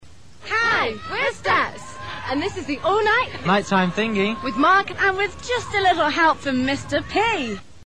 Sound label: steps